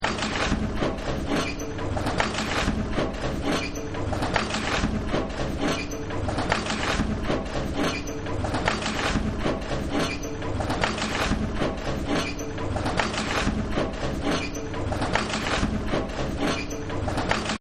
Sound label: Factory; Factories; Backgrounds